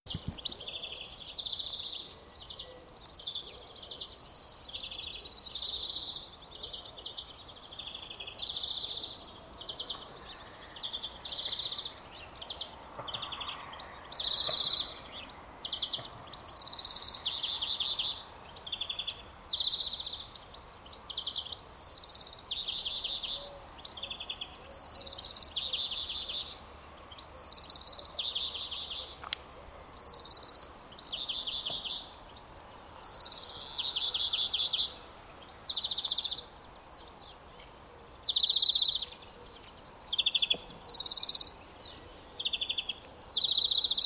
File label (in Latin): Chloris chloris; SETE